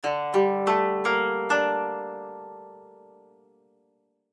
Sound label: Koto